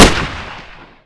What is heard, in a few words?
ump45; cstrike; ZP CRISTIAN; ZPB WEAPONS